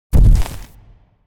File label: Footstep From Monster Dinosaur With Debris; Hollywood Edge; sound designer's toolkit v2